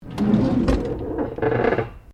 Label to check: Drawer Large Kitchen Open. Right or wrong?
Right